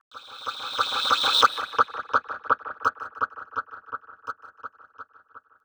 rev ticker, Drum N Bass; Sonic Foundry (Sony Creative Software); Knutrix Drum & Bass

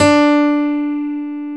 PIANO D4; E-MU Formula 4000 Series Vol. 2

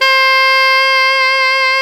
SAX ALTO MF; Alto Tube

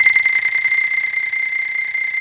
KOTO C5

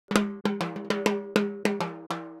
Timbali, Latin Percussion; Sonic Foundry (Sony Creative Software)

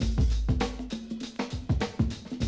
drum loop, 100 BPM

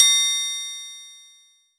Triangle, Percussion; Roland BK-5

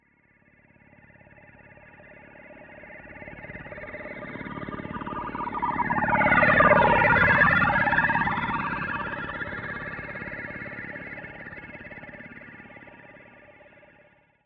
MORSECODE; AKAI Anime Sound FX; FLYOBJECTS

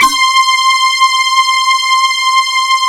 DS8 C 3, Korg

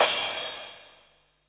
Crash, retro house samples, Drum Hits

